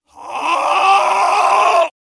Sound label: yell, reverse